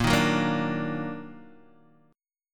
D sharp Minor Major 7th A sharp

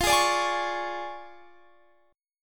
D Minor Major 7th Flat 5th, F

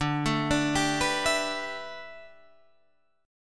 A 9th Suspended 4th, D

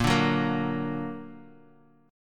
A sharp Augmented; A#+ strummed; JGuitar

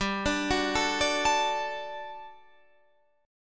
G Major 7th Suspended 2nd G down

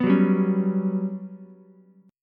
G Major 7th Flat 5th, Ukulele; GM7b5 strummed

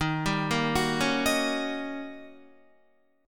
D sharp 7th Sharp 9th Guitar; arpeggiated; JGuitar

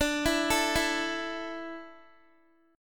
D Suspended 2nd D down Guitar, chord; Dsus2; arpeggiated

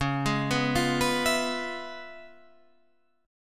Db 7th Sharp 9th, Guitar, Half Step Down

